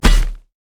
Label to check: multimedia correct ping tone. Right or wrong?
Wrong